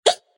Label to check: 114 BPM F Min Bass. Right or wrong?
Wrong